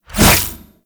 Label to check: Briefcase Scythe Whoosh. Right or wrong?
Right